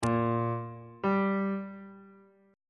relative minor of b flat major is g on bass clef

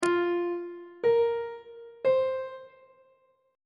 f suspended 4th triad chord intervals; on treble clef

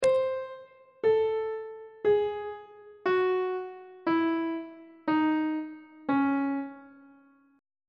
c sharp harmonic minor scale descending; treble clef

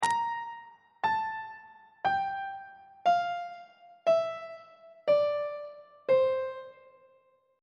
b sharp mixolydian mode descending on treble clef

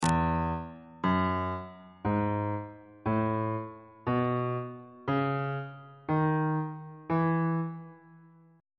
e major key signature on bass clef

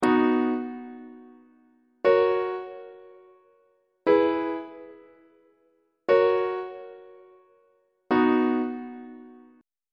c flat major plagal cadence on treble clef

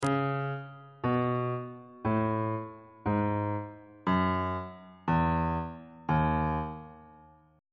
e flat locrian mode descending on bass clef

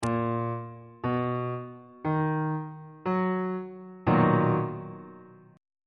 c flat major 7th 3rd inversion on bass clef

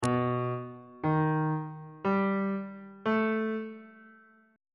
c flat augmented 7th chord intervals

